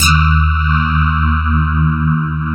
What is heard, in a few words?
CMI PIANO; East West; Twisted Textures-BT